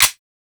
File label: Revolver Pistol Mech